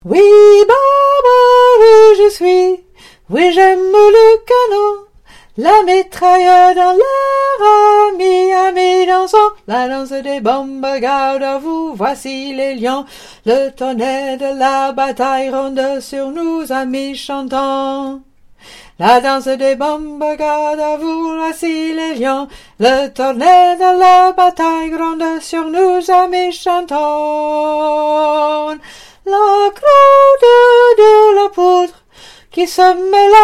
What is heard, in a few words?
Alto